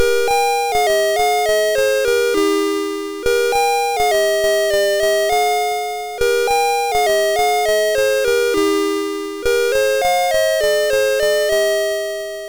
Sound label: sid MOS6581R3, GAMES; Alice In Wonderland